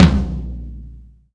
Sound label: TOM, Laidback; Sonic Foundry (Sony Creative Software); Loops & Samples Drum Tools